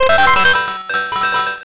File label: jackpot